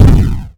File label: explosion ground; FireSoldier